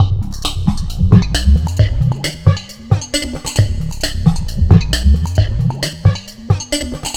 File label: Drums Strange